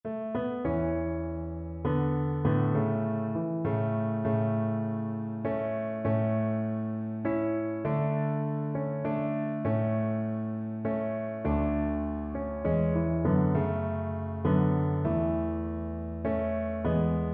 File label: Cello